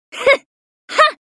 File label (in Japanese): 「ふっ！はっ！」; スキル(エクステンションアローEX)